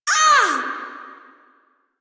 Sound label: Pain Lich B